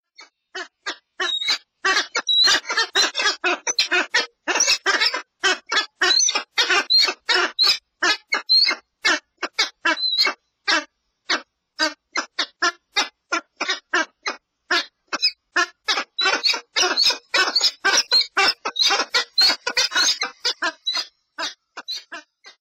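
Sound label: Peregrine Falcon, Komatsu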